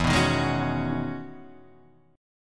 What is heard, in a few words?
E-Major 13th, chord; EM13 strummed; 0,2,1,1,2,2; JGuitar